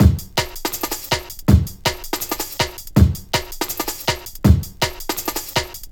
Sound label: Hid, breaks; Jungle; Jungle Warfare 1&2